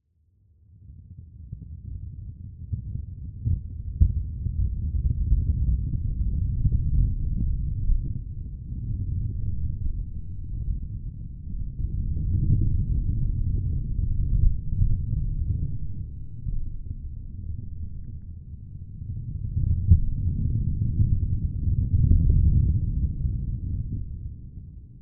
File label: lfe tremor rumble; sound design